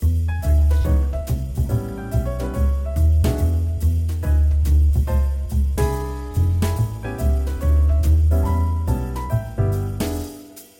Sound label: bar swing trio